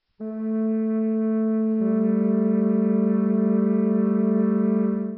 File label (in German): flöte